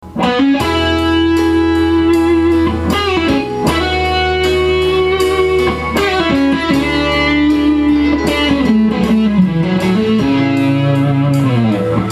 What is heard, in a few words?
blues solo; anthematic